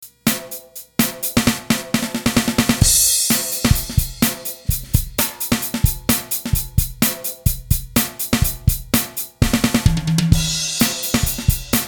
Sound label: Dual'n Drums